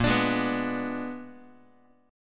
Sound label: A sharp 7th Flat 9th A sharp down Guitar; A#7b9 strummed; JGuitar